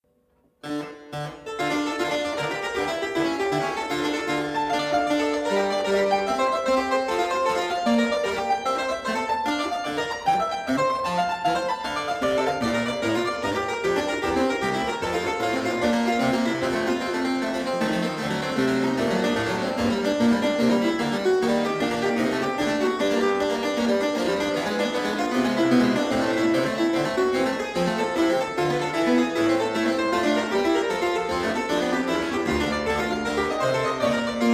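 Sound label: Flemish harpsichord, D minor; historical instruments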